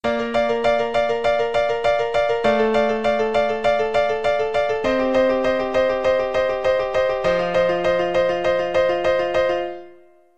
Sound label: simple things, piano